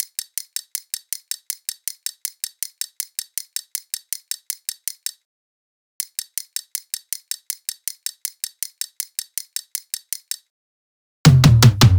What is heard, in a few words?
hats 160, Hat Loops; drum sample pack; Ebeko; SEISMIC; Slate